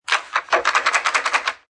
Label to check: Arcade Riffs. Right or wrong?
Wrong